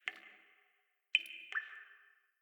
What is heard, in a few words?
water drip interior